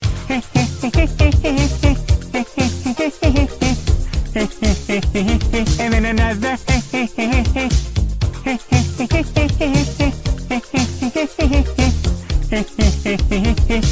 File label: hehehe funny